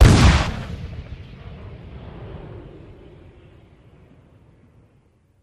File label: Cannon Blast Single